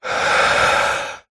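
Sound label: human male exhale breath out through mouth; zapsplat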